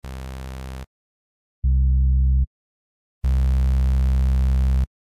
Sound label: Bass